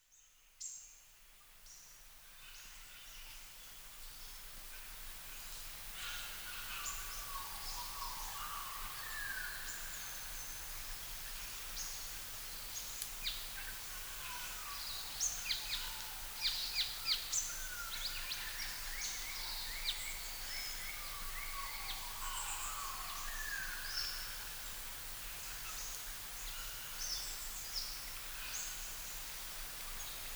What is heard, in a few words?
karri lake stream